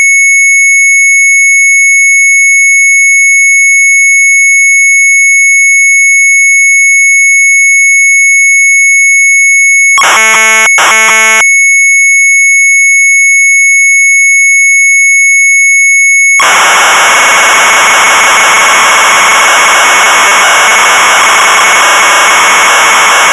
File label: MZ800 Tape, sharp; DISASSEMBLER 700; retromelsarchive